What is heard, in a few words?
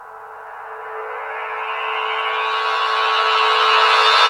rise in; Reverse Riser 2: Spooky reversed crescendo sound for techno or suspense